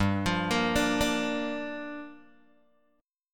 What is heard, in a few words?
G Minor 7th, Guitar, Open G